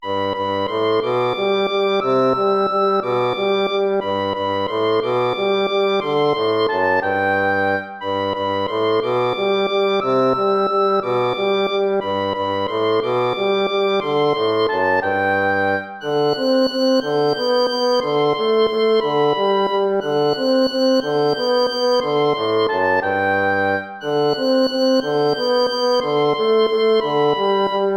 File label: waltz; Carulli Ferdinando